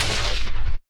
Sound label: gun fire